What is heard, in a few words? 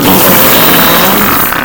fart mega